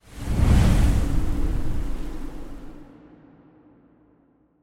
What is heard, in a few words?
scene change; spellbound